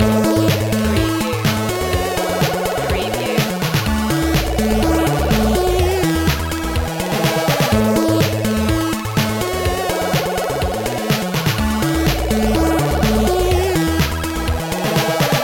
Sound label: retro video game hotseat loop